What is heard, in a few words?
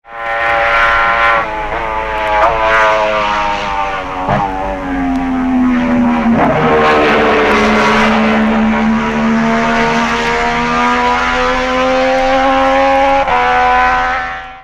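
MV, motorcycle; 1966; Agustini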